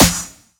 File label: Snare, Dubstep One-Shots Drums